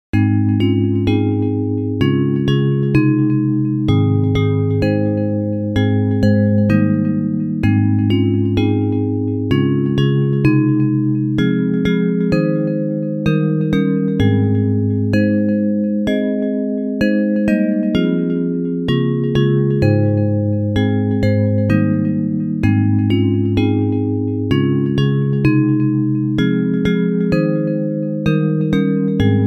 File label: Bells; Hymns